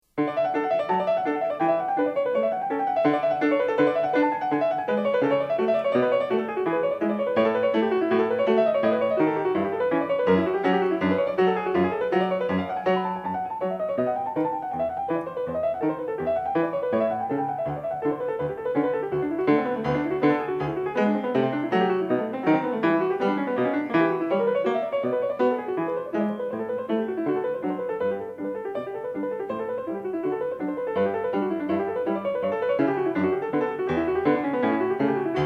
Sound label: Prelude in D major; Bach, Johann Sebastian; Well tempered clavier book 1, No. 5 in D major, BWV 850- Prelude